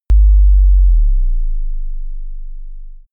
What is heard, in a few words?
bass drop short